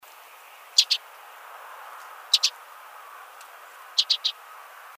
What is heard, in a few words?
Sedge Wren, San Mateo